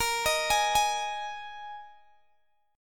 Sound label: Bb 7th Suspended 4th, Guitar, Open G